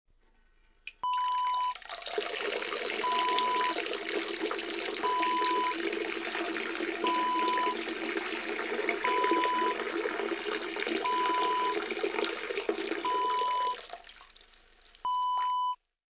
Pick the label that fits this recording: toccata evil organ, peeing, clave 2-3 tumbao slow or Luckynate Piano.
peeing